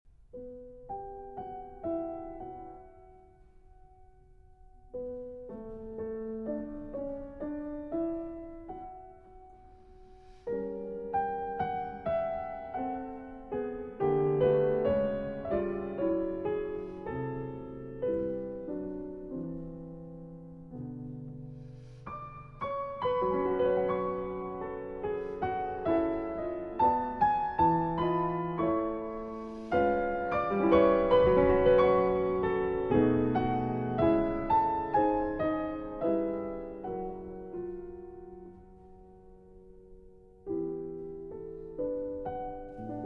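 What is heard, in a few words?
Variations on a Polish Folk Theme, Piano Works; Szymanowski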